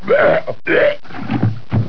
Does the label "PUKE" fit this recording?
Yes